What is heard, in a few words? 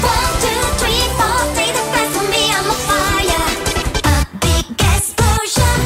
a big explosion